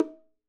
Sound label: bongos Single Hit Right Hand; raw perc one shots; SEISMIC Drum Sample Pack; Slate